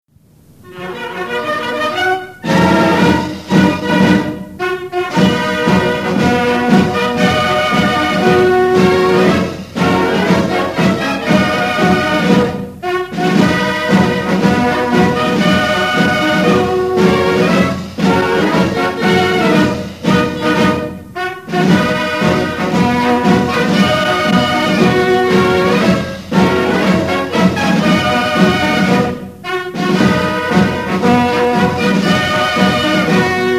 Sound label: brass, military music in Italy; RISORGIMENTO; WWI